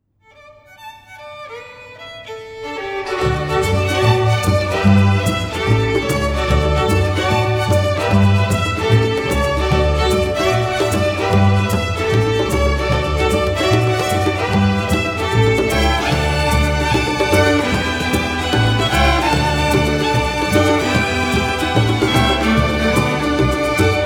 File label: Pennywhistle